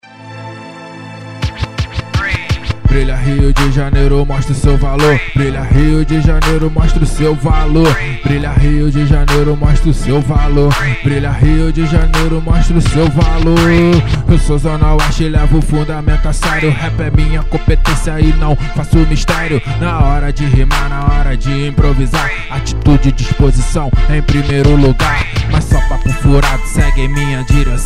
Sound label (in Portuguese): rap e circo